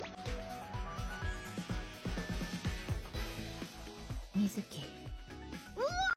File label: screams